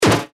Laser Thwack Zapper, laser gun, science fiction sound effect